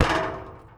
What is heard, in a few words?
Street Ball Metal Back